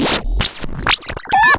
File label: Cwejman S1 - Mechanical Arm